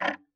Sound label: Tick, Clocks Kitchen Clock